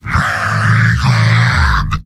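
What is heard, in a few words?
positive vocalization, male; heavy; tf2; garrysmod; mvm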